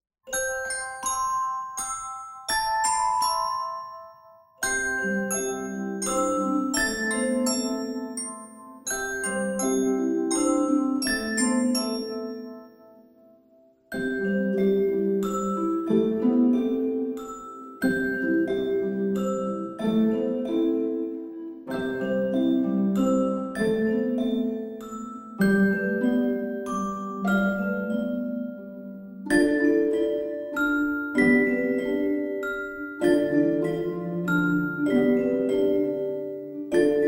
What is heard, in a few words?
Lullaby; Good Omens